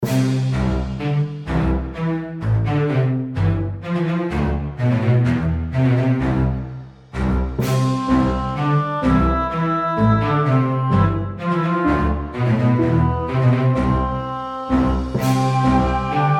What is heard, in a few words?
Menu Theme